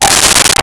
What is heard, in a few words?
Breath G5; Nintendo; July 2020 Gigaleak